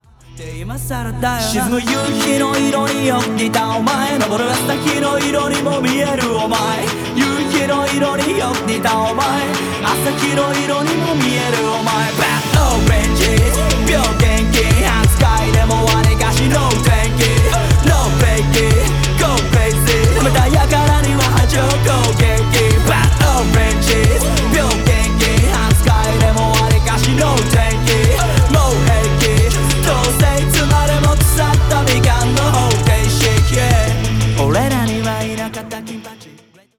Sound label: Climax; A.I.M. All You Need Is Money; Android